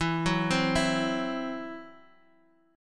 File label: E Suspended 2nd Sharp 5th E, down, Guitar, Half Step Down